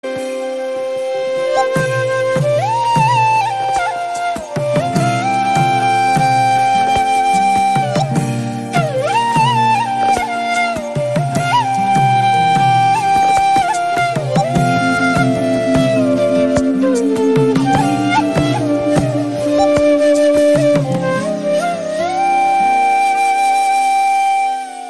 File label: Flute BGM